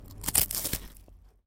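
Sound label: wafer sounds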